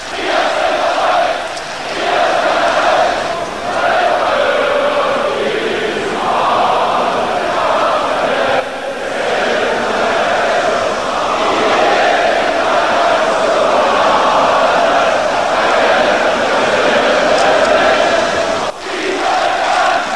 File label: ambience; cstrike